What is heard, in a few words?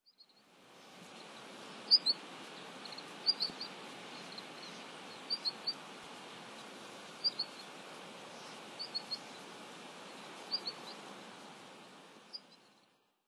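benimashiko, Long-tailed Rosefinch; nemuro; koe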